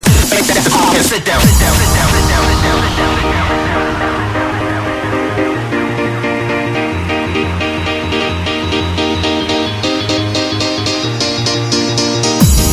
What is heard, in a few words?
Crowd Rokka, happy hardcore